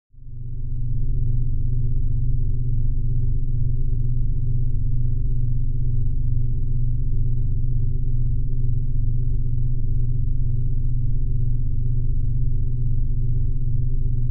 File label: Space Ship Hall Hum